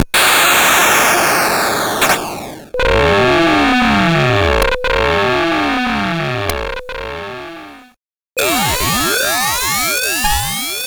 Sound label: Hits DCM89